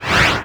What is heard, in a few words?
value down; Decors